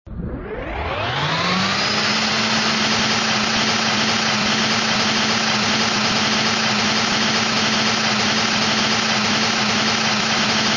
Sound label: machine power up down